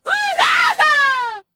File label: kid fall; monsterplex